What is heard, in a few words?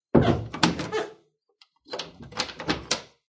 Wooden Door Close And Lock; Miscellaneous Doors